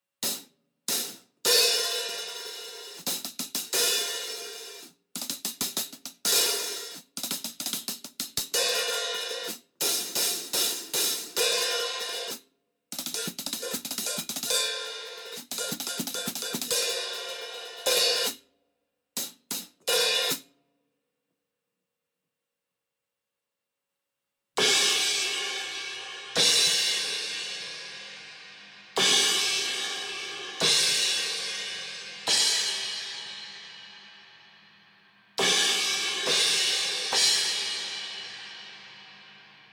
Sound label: Hihat Cymbals; Fame MS Drumkit Pro